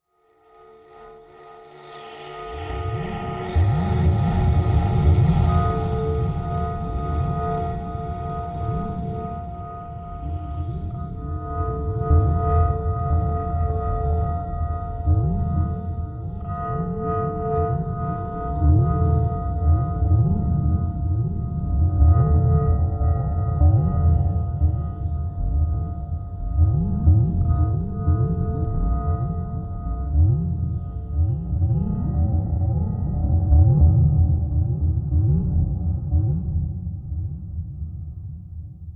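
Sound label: Space Bell Distant, sci-fi ambience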